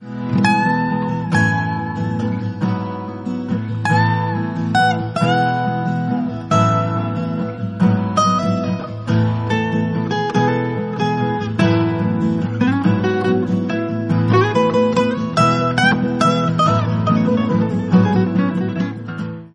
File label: romantic